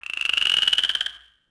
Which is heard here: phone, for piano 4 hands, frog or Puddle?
frog